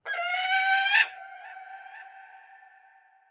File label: ambience bad birds b; smite; conquest